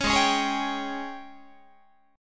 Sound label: G sharp Major 7th Suspended 4th, Guitar; G#M7sus4 Chord